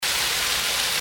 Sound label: water spray; barbie; puppy water slider